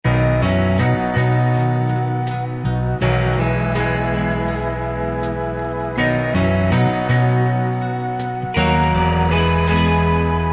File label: B No Backing Vocals